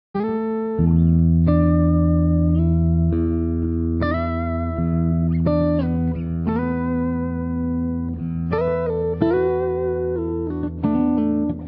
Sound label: Dualtone Jazzy, guitar